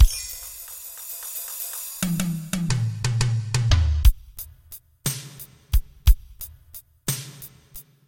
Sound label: Triplet enka loop